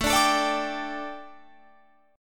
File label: A 7th Suspended 4th, A, down, Guitar, Open F; A7sus4 strummed